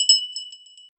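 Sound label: gentle belllike, bell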